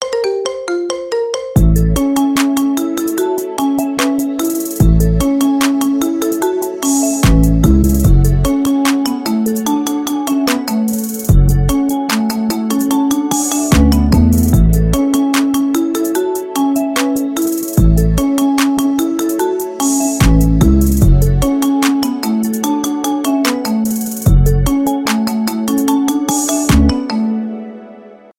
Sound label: marimba remix